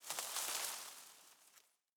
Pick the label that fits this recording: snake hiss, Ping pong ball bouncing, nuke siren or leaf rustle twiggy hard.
leaf rustle twiggy hard